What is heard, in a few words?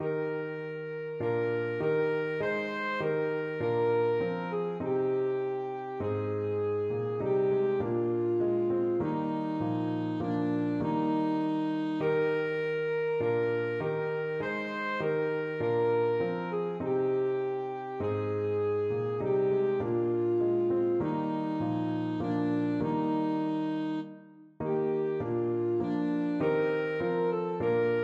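es ist ein ros, alto saxophone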